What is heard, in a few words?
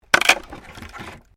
WOOD PLANK SPLIT